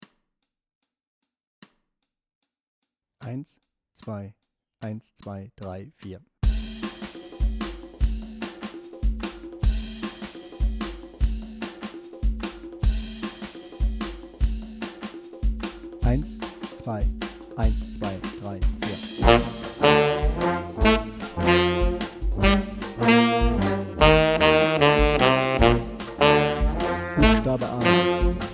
Einzähler 150 Tenor Sax Anfänger

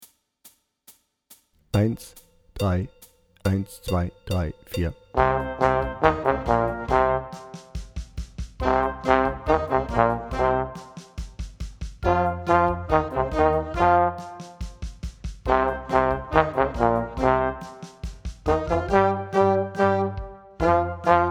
Hard Rock Blues Posaune lauter

